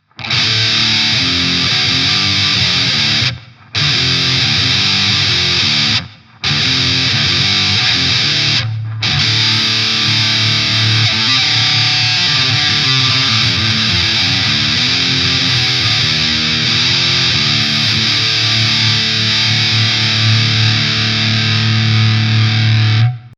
Electro Harmonix Nano Metal Muff Distortion; Tr. 3h Mid 11h Bass 3h Gain 12h

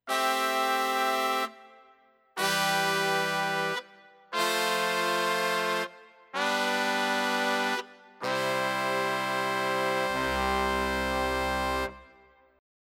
Ensemble Brass; Tyros5

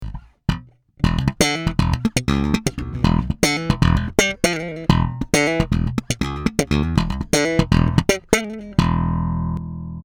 SpaceBass active Slap Bridge PU

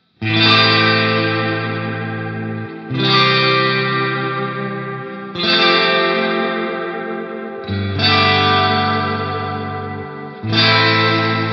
Surfy Industries Surfybear Classic Reverb clean

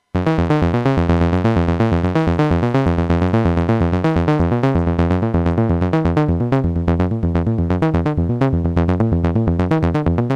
Acid8 MKIII Filter Reso

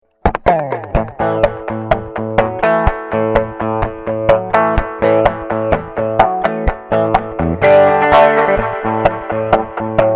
Vintage Delay

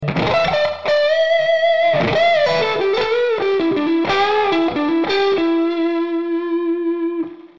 Neck Middle Dirty Blues Lead

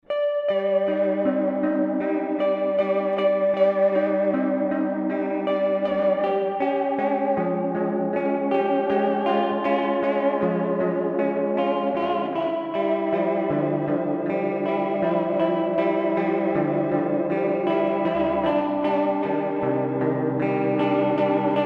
Seymour Duncan Dark Sun

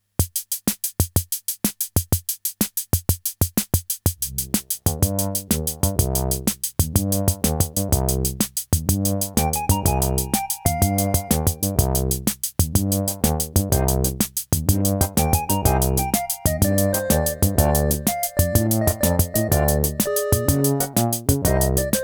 Casio MT-400V One Take Performance

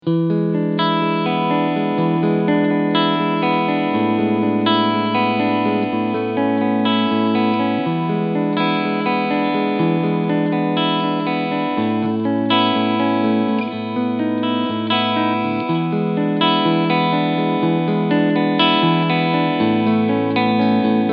Ibanez RG657 Cleansound Position 2

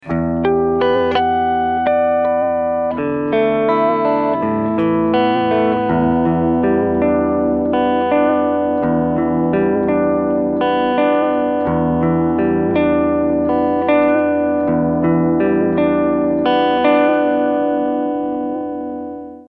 Vibrato

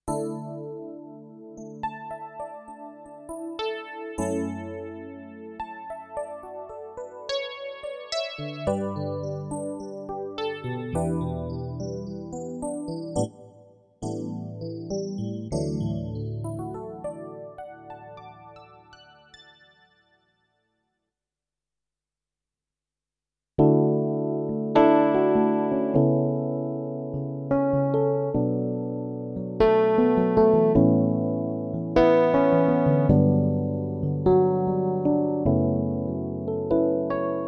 E Pianos

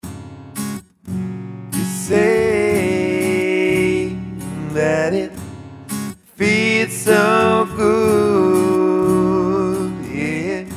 BOSS VE8 GIT ENH green Vox Room Higher

